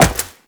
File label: Wall Jump Metal; Warframe; Tenno; Wall Run